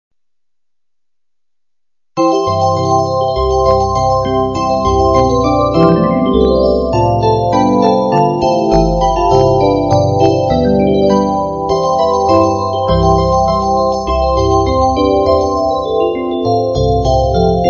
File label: Jingle Bells